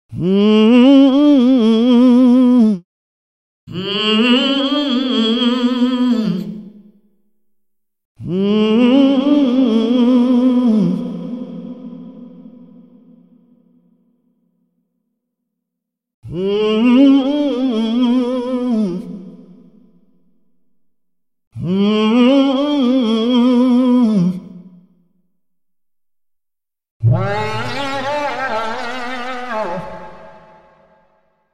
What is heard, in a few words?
hum